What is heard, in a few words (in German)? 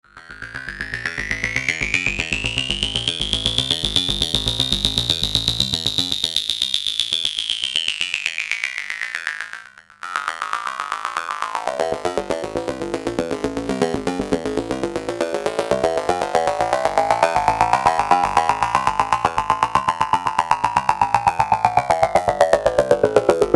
EMS Logik, Elektronische Musik